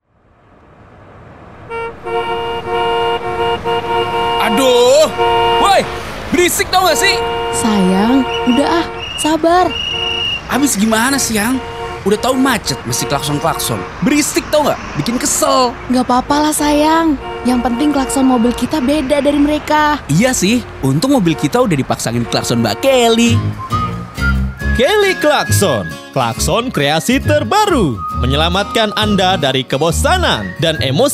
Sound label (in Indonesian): klakson